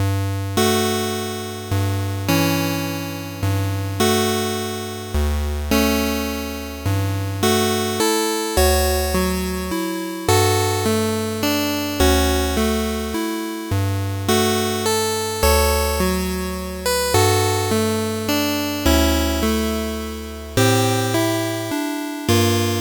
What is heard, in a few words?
8bit bgm fast